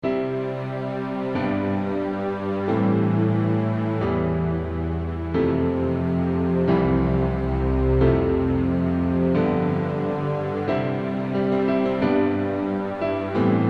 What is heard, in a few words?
Canon In D Jazz Style; Pachelbel; Soundfont